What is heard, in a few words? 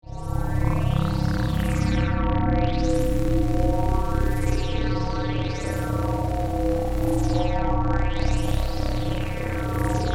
Drone Synth Phase; Scifi, Science, Fiction; BlastWave